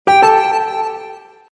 sync start, phonetones Samsung SGH-i600 system

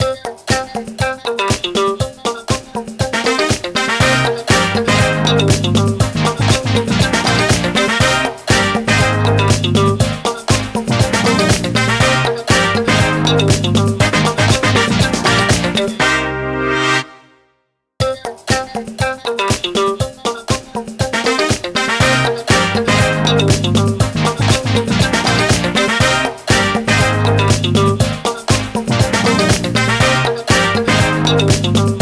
Pleasant Disco, ringtones; LG Wing 5G Verizon

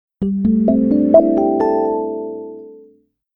Alarm, phonetones, Nokia; Nokia E7

